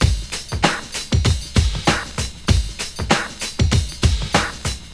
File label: LOOP6 R, Drum Loops; Big Fish Audio; Prosonus 500 Drum Loops